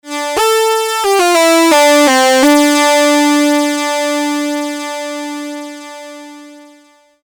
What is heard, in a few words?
Polivoks original lead